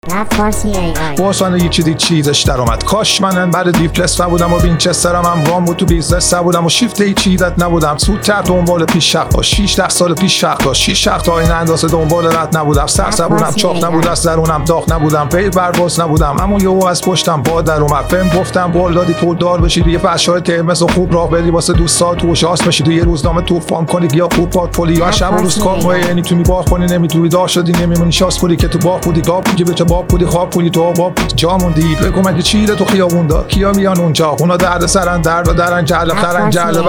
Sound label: Rap